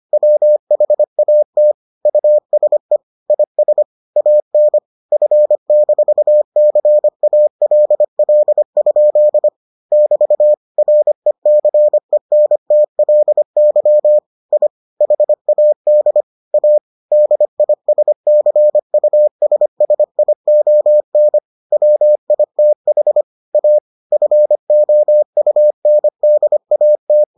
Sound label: morse